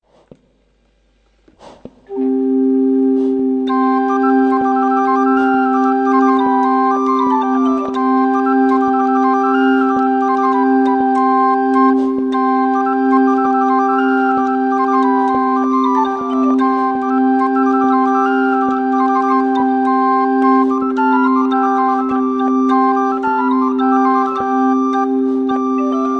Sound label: intrada portativ; pro aqua de hillis